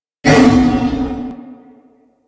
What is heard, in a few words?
Wolf Attack; Animals; DE; Warframe; Quests; Duviri